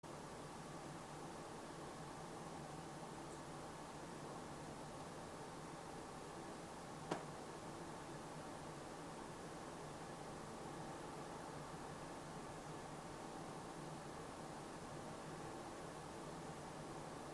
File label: clasp snap; hear multiple times over wkend; Devoe House; EVP